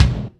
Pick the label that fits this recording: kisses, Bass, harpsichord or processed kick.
processed kick